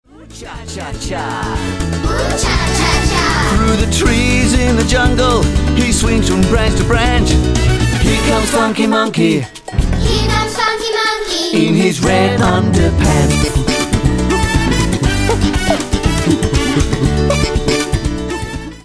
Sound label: Funky; Monkey